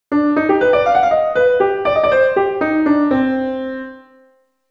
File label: Jazz